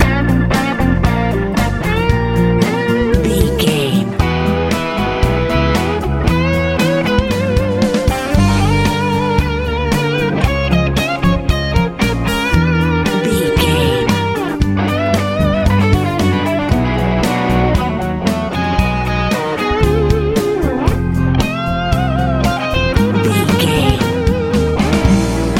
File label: easy pop